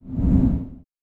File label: Whoosh Slow Deep; Whooshes